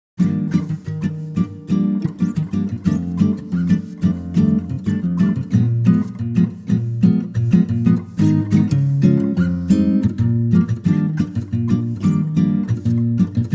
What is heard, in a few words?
Eb acoustic guitar